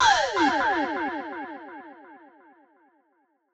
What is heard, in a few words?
FX Breath Sine